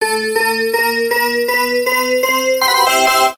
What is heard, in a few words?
freespin add; games; Enchanted Cleopatra